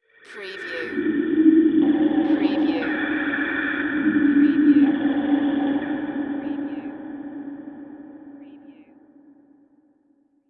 Ghost Breaths Airy

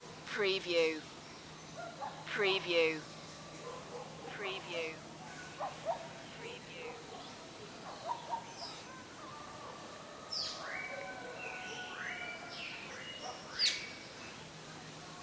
village morning

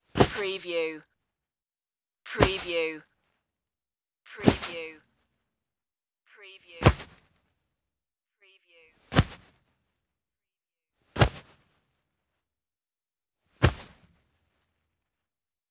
sit down on couch

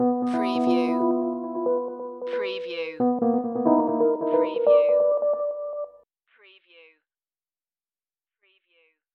Hip Hop Dark Rhodes Licks 90 BPM Swing - E

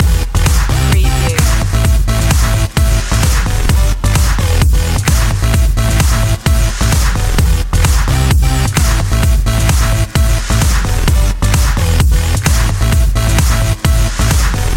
gimme a beat loop

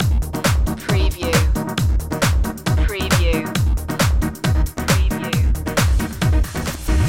tenacity loop

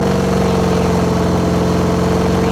Chevy V8 Hot Rod Engine Loop

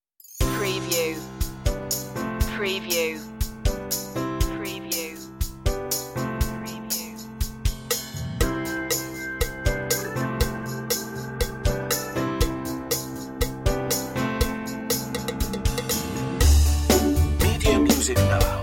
life style tv light comedy

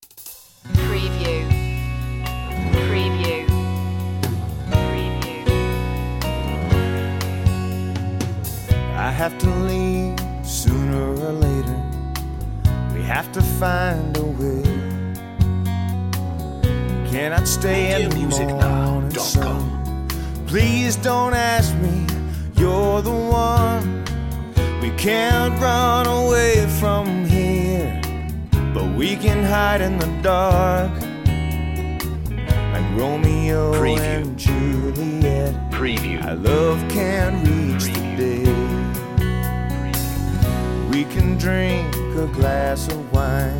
vocal

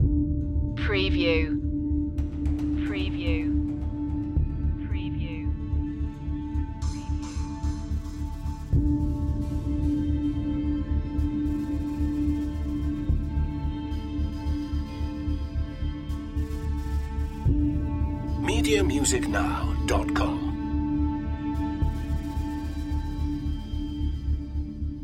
dystopian machine loop

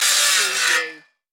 Circular Saw Cut Wood

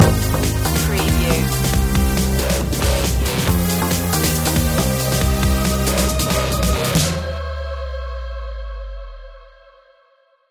thunder cat stinger

